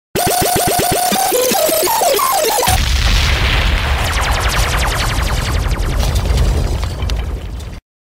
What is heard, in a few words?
backspin pull up